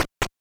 stairs loop; movement

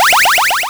powerup

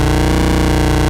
car loop; movement

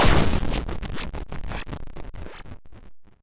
odd, explosions

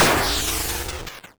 cluster, explosions

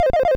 sounds pause out

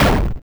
hard, explosions

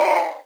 screams human

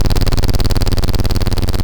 helicopter loop; movement

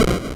various, explosions

